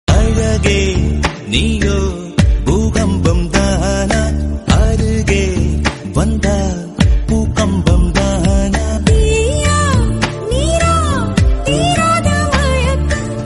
tamil song

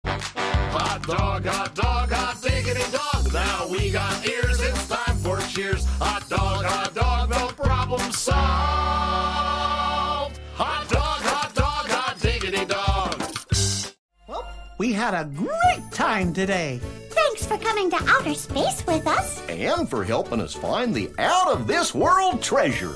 english song; mickey mouse

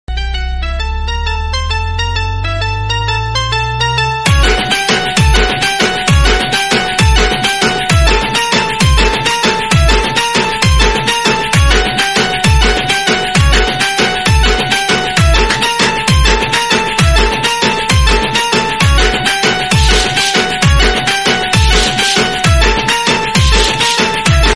dj remix hyderabadi instrumental

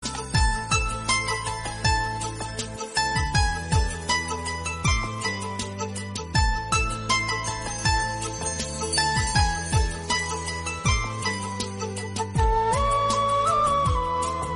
background music south

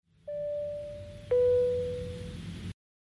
seat belt plane ding notification sms alert tone

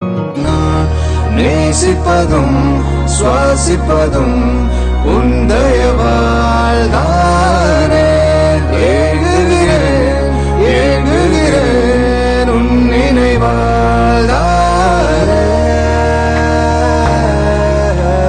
tamil song

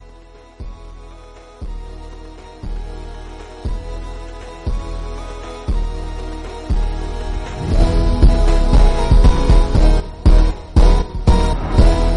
crystals instrumental music

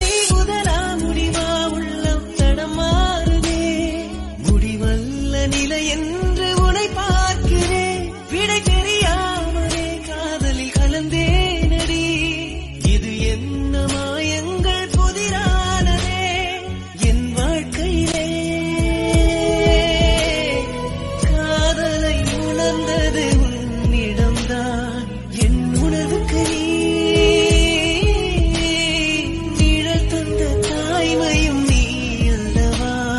tamil

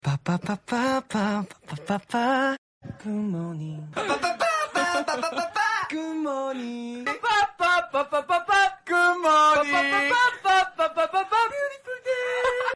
korean song

